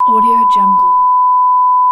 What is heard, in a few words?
censoring beep long